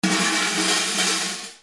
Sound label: BREAKING